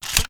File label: reload start load; gmod